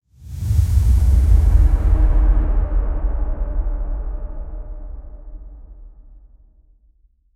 epic cinematic entrance, rising tension

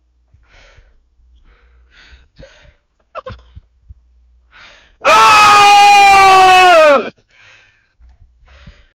the sound of a man, fight

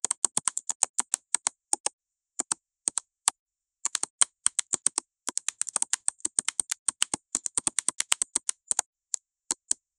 computer

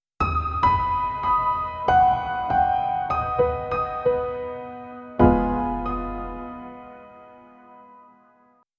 a heart rending piece for, sad

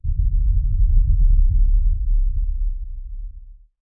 monster

